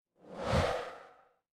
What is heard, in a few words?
ui swipe